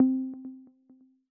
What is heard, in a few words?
Timer Countdown; Real Dealer European Roulette